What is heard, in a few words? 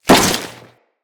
Crewman Step Flesh, Footsteps; Corpus